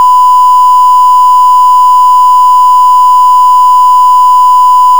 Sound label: 1kHz 4bit